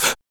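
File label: vox percussion, Vocal Planet Jazz FX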